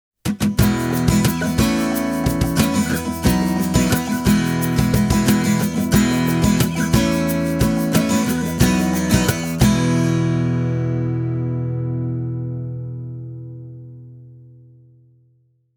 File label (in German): Westerngitarren Stagg SW210CS; Rockway